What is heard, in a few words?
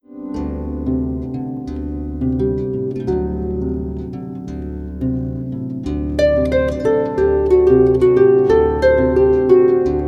blues